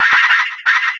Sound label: vox laugh; Voice Vox; civ13; civ14